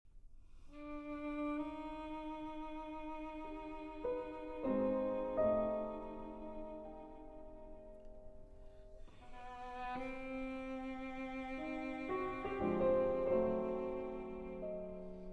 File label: Cello Sonata in G Minor I. Lento - Allegro Moderato